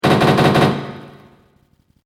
Rapid Machine Gun Shots; Andrew Fleming

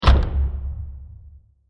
Deep Hit; Bursts; Impacts

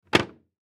Kitchen Cabinet, Cabinet Door Close; Household; Cabinet Doors; Sound Effects:Doors

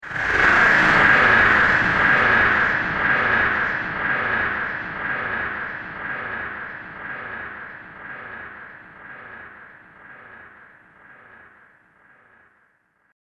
Epic Slow Motion Explosion; Bomb Sound Effects; Andrew Fleming

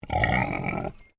Animal Pig Landrace Growl; Alice; Animals from Edgar's Mission Farm Victoria; Pig Sound Effects; Pigs (Sound Effects:Animals); Foundation1

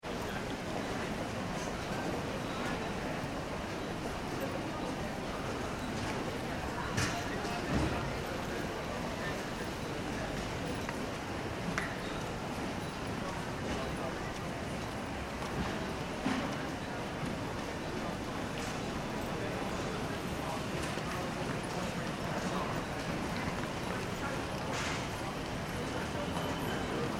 ambience airport check in; Airport Ambience - Check - In Hall - London Stansted Airport; Airports; Backgrounds; McKinney Sound

